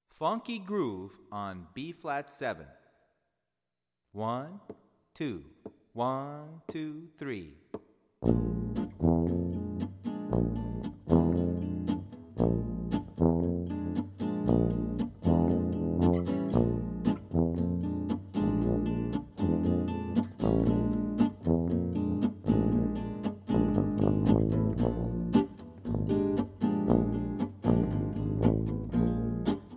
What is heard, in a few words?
Bb7 Funky Groove, Bb7 Bb D F Ab